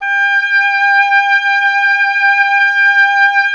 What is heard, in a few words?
WND OBOE, Solo Instruments; Miroslav Vitous; Solo Instruments VOL-2